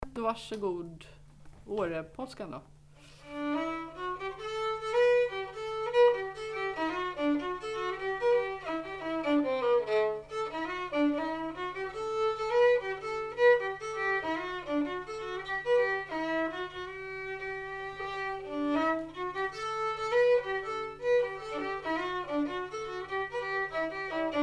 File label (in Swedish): Årepolskan